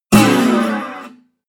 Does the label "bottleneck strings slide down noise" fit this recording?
Yes